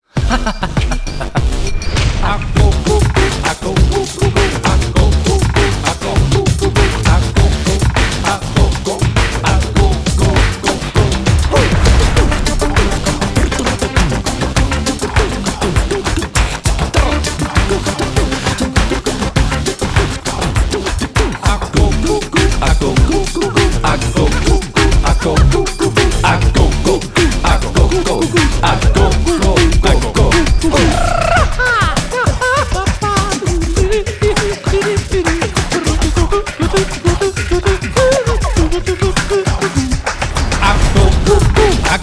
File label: agogo; Kompositorische Specials